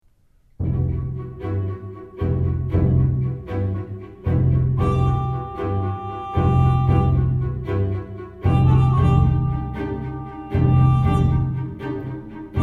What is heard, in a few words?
tango, Berlin